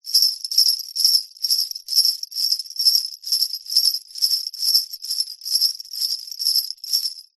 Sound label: musical sleigh bells slow shake